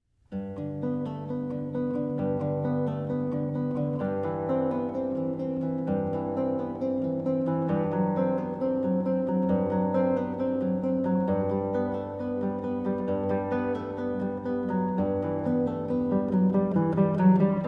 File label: Cello Suite No. 1 in G Major Prelude for Guitar; J.S. Bach; BWV 1007